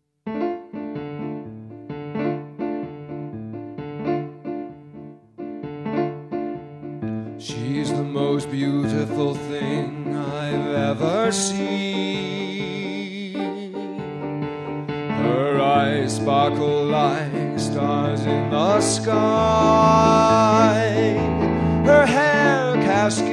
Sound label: Sappy Tango